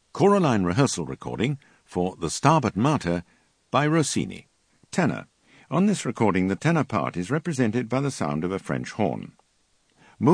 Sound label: Tenor; Rossini Stabat Mater